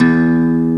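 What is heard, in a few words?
acoustic guitar E1; EL-GUT G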